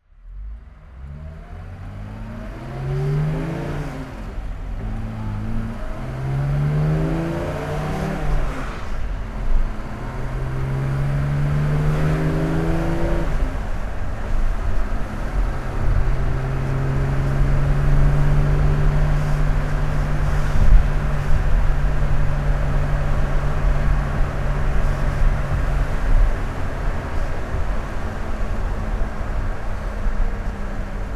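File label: mercedes benz 280sl manual drive; Mercedes-Benz 280 SL 'Pagoda', 1970; Engine sound driving; V3D/ ClassicarImages; Marc Vorgers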